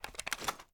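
weapon foley assault rifle reload fast empty dmr end; weapons; gmod; viper; scharlie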